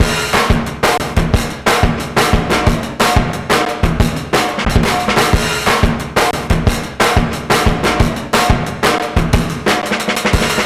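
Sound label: drum 180, Breakbeat, 180bpm; Best Service ProSamples vol.40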